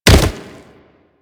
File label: Kubrow Land Dirt, Footsteps; Enemies; Warframe